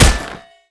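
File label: Damage Axe Cloth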